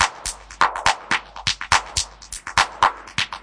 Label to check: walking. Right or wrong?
Wrong